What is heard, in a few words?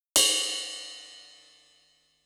Ride Cymbal, Yamaha RM1X; AnlgKit01